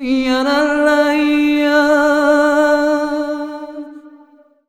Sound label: VOX, Balkanish Traditions